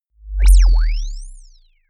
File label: bass drop sizzle soft